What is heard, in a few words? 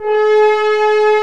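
French horn; Roland - LA Composer vol. 1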